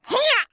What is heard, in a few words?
Rat Attack